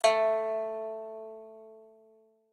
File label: pipa